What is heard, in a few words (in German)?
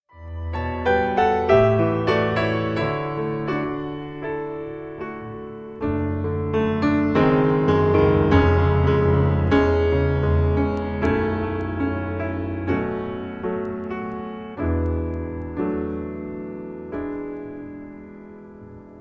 vaterunser instrumental